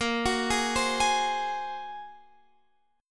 Bb 7th Suspended 2nd Sharp 5th Bb, Guitar Half Step Down; Bb7sus2#5